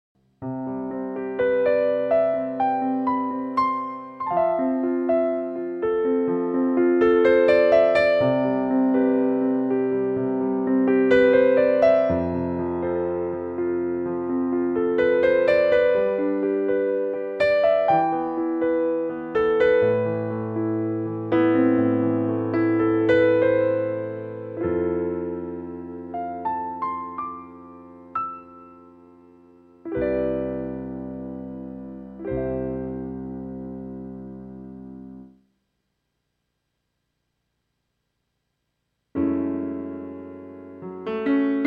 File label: Piano